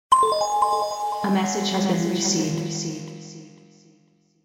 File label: messege recieve